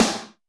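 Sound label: Snare Squwank, Drum Machines Alesis D4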